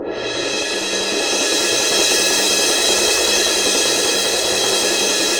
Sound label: CYM, Percussion